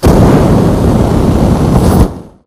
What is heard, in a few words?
amb fire blast; Titanfall 2